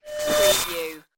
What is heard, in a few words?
futuristic door slide